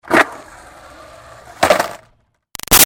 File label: Skateboard Jump Wheel Spin Land; Skateboarding; Sports; Big Room Sound